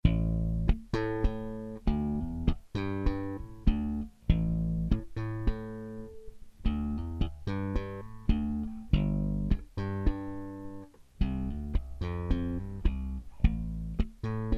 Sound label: slap; Sterling Ray35